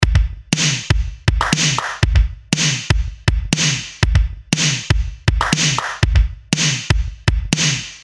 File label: Transient Shaper Wet